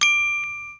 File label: glass; openspace3d; kinectdemo